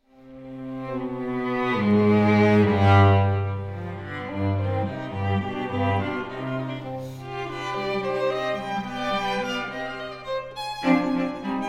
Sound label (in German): Streichtrio c Moll Allegro Con Spiritu; Beethoven; Op9-3